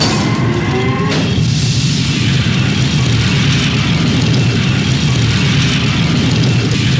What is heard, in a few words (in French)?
activation porte; de stargate